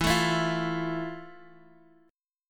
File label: F Major 7th E